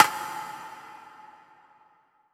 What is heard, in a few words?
percussion; VES1 Percussion